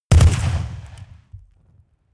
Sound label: rifle ak-47 exterior fire double big; comedy cartoon; pistolen gewehre; Pistols and guns